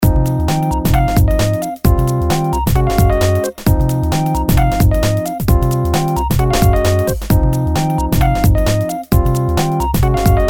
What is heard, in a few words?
simple bgm loop